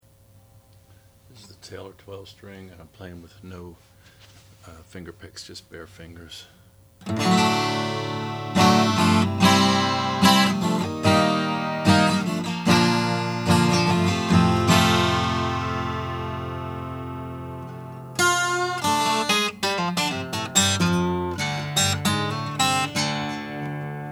taylor 355 jumbo natural

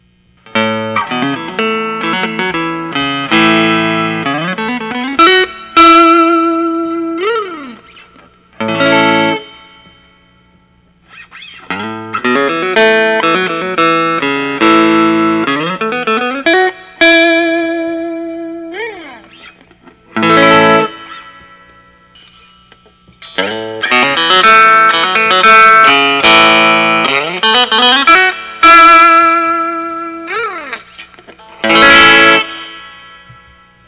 fender stratocaster sunburst

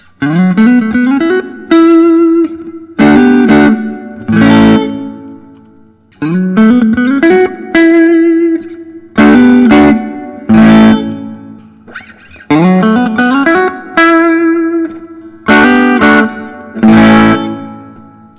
zion guitar rootbeer; zion guitar technolo

